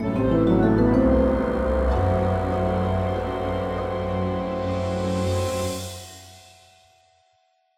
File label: Puzzle Win; Beetle; tintin reporter cigars of the pharaoh